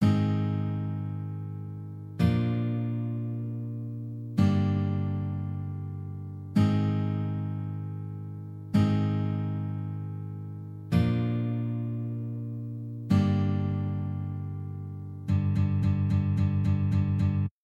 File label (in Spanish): PUENTE, guitarra acustica